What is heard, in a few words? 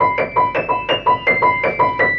piano stop it, sad; Loops